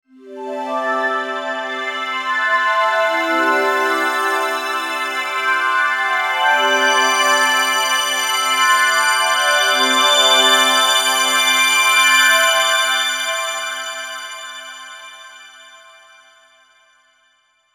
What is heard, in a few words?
Shimmer Crescendo Swells Flutes; Ascending; Blastwave